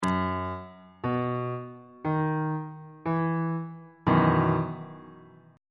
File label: d double sharp major 7th suspended 2nd 1st inversion; bass clef